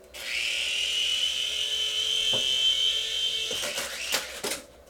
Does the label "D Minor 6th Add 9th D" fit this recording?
No